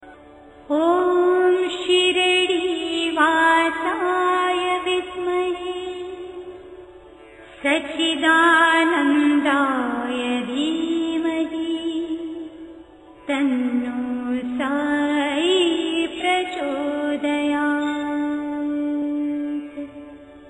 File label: ringtone; shirdi sai